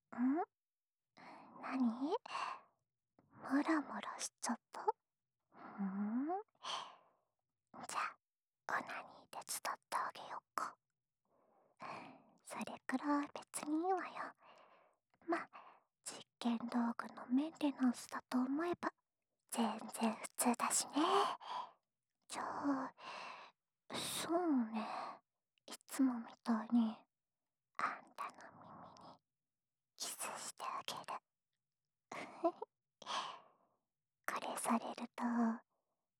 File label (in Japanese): オナサポ用耳舐めループ（右耳）, ASMR